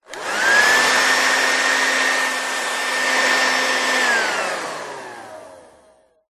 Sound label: household vacuum hand held short operate